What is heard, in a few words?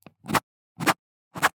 Handwriting Ball Pen; soundbits